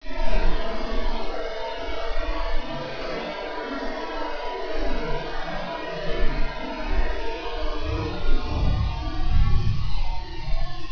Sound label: ancients drone, world ambient